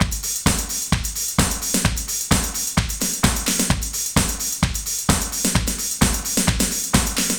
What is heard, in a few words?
loops 130 bpm; FRY DA NIGHT; Masterbits - Tekkno Trax